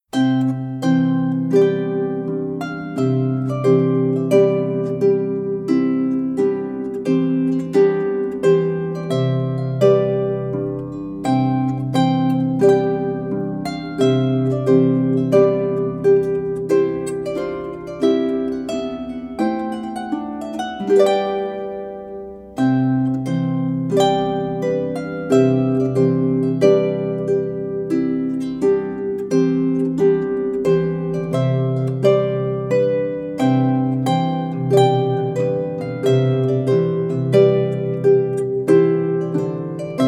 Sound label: Trumpet Tune, arpa celtica